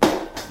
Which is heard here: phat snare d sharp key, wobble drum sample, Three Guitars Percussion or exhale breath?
phat snare d sharp key